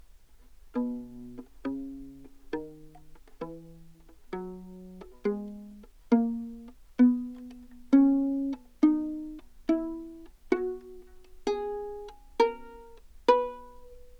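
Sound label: baroque viola scale pizz ascending, Anechoic; OPENAIR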